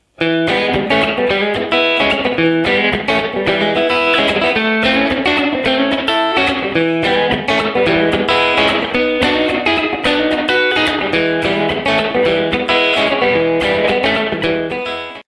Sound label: rockabilly rhythm guitar